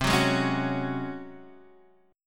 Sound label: C sharp Major 7th Suspended 4th C